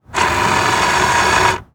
Transportation Car Monte Carlo Adjust Mirror Short